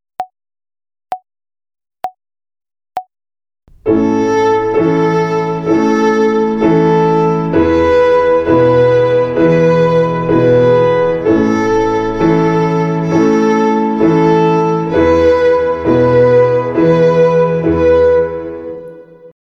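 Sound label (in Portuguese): Ginástica para o dedo 1 - Corda Lá - Quaternária, Violino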